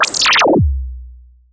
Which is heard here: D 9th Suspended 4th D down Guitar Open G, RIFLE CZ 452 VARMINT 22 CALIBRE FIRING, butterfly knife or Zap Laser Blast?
Zap Laser Blast